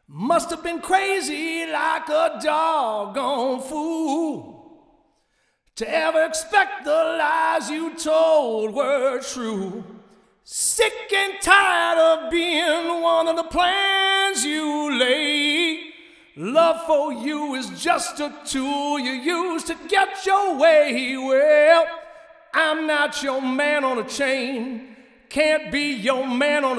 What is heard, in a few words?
male vox mix